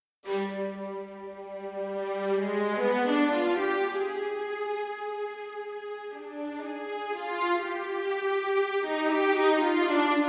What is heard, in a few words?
Realtime playing with a breathcontroller, strings